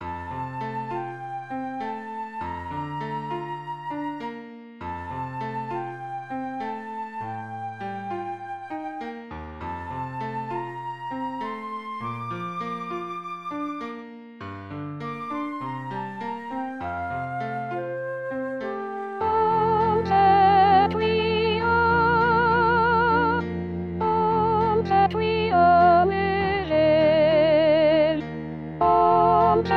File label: Full choir